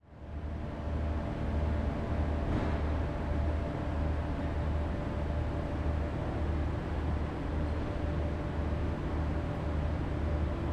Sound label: public places train station lausanne switzerland 11pm few people trains; cff